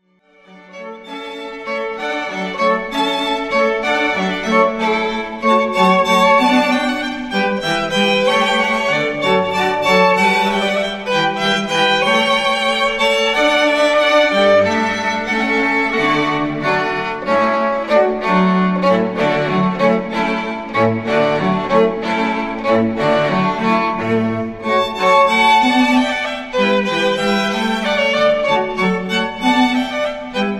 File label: Water Music, String Quartet